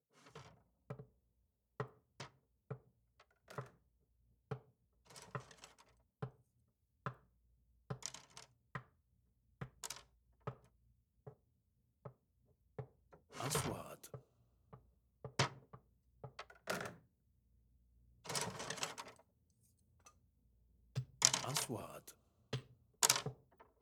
خطوات رجل رمي الخشب

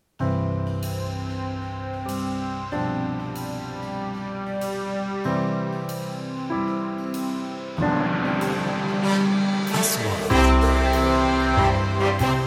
موسيقى تصويرية وطني

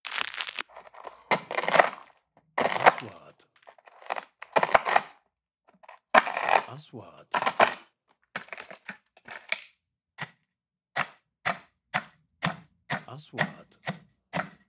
صوت كيسة شعيرية قهوة دق طحن, kitchen

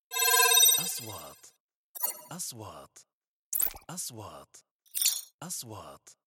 نغمة حركة الكترونية; transitions

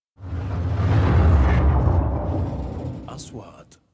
إنقالة هوائية; sound effect; transitions

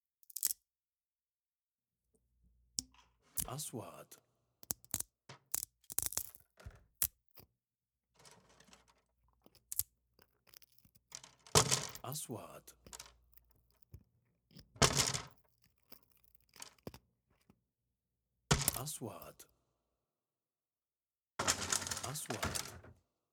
رمي تكسير خشب, woods; sound effect

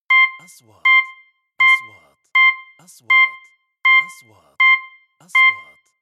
نغمة تنبيه تنازلي; transitions

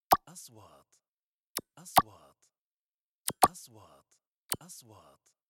نغمة حركة طلقة; transitions

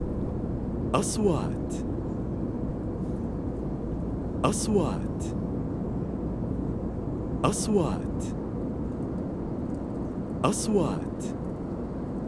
الصوت داخل رحلة الطائرة, airplane sky wind

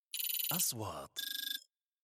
نغمة نقره الكترونية, transitions

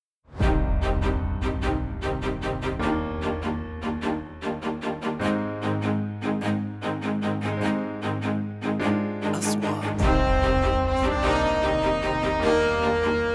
انشودة السلام, watani

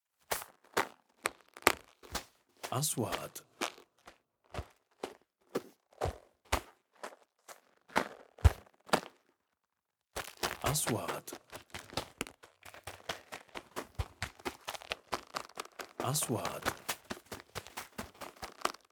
خطوات على أرض صلبة; sound effect; رمال

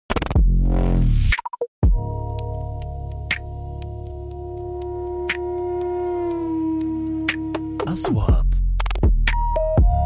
فولي

